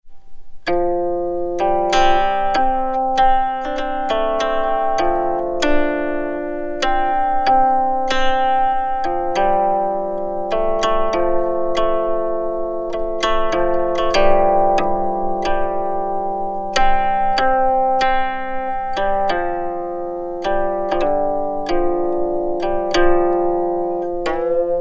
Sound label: Guqin Playing; Mic